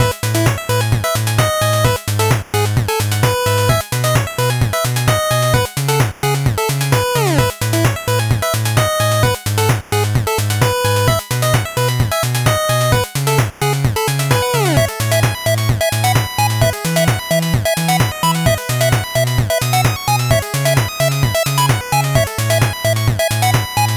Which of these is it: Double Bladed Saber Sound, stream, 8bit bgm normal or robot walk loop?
8bit bgm normal